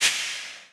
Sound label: Mine Dud; Worms3D